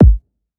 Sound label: Classic hiphop kick